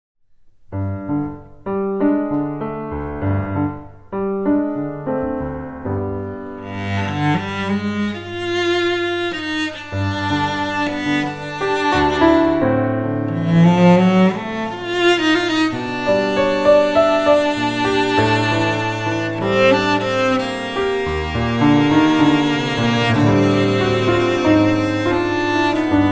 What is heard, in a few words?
tango